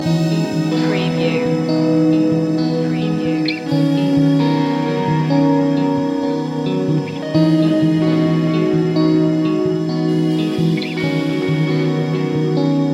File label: moving slowly loop